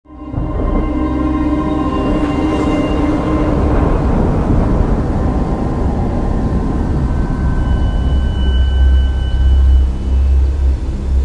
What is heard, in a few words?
Atmospheric music